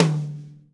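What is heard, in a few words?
mid tom rimshot, drum kit; 90s sample CD; drum kits small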